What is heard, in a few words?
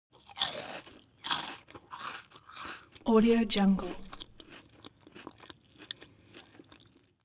human mouth chew biscuit one piece; smartsoundfx